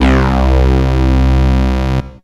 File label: Yamaha SY35 Buzzy Synth Lead C4